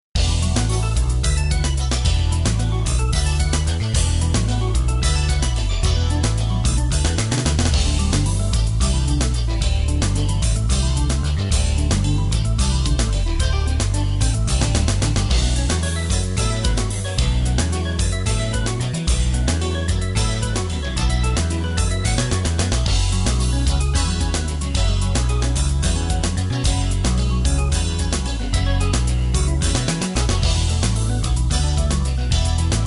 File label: Fusion